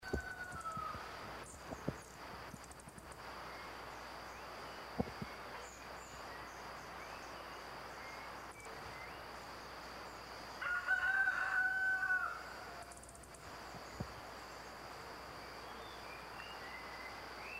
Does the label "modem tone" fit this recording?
No